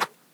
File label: Swish, organic wooshes; Micro Pack